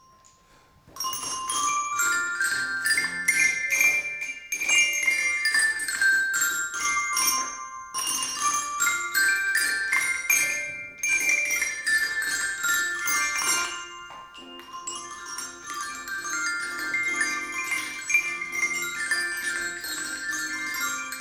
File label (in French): impro carillons; CE2-CM1